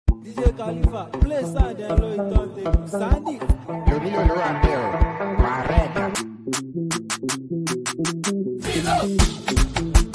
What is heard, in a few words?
Dance Beat